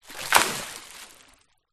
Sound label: nature water splash; zapsplat